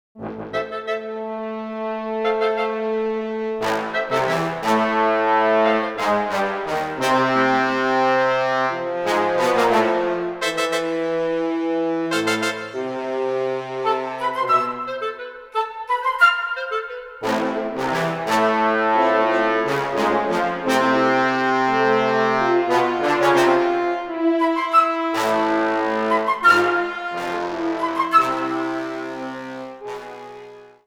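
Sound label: Music Boss Battle Layer Orchestra; MushroomBoss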